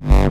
Offbeat Bass G; Vengeance Samples

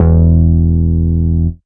Root E, Bass

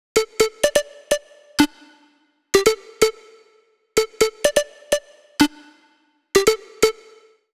126BPM Melody Root D; Vengeance Samples; Inception Kit

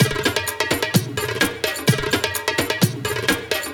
Latin House Loop 128 BPM; Vengeance Samples

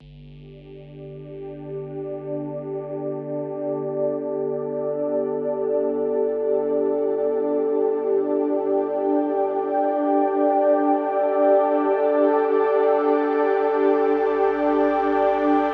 128BPM Pull Pads G, Melody Fills; 16Bars; Vengeance Samples

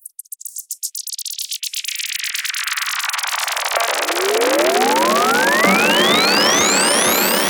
Sweeps Long

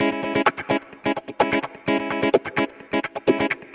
Electric Guitar Kit C# min, 128BPM; Vengeance Samples; Special Sounds

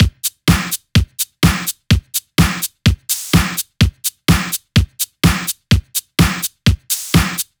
Thai Kit 6, 126BPM; Vengeance Samples

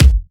Bassdrum; Vengeance Samples

